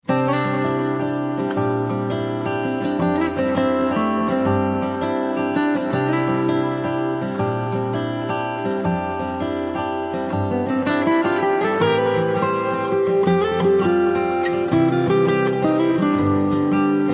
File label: a rustling in the trees, Ringtone; Apple